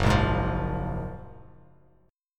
Gb Major 9th, down, Bass Standard; GbM9; strummed; JGuitar

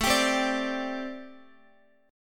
A Minor 11th, Guitar; Am11 strummed; JGuitar

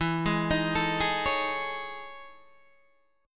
E Major 13th down Guitar DADGAD

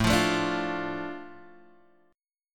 A Suspended 4th Sharp 5th, Asus4#5 chord; Asus4#5 strummed; JGuitar

